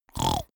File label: snore short; soundpool